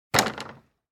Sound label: wood gate; Inquisitor 3